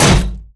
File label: bullet heavyballistic titan; Titanfall 2